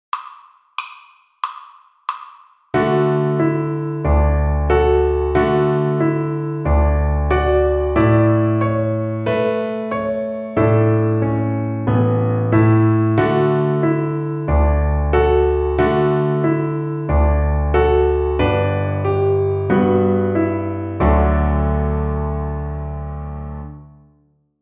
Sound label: String Gym, Piano Accompaniments